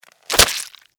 Newspaper Hit, Extermination, Newspaper, Hit, Squish, Insect, Bug